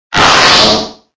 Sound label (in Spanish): Grito; Morgrem; Gritos de Pokémon de la octava generación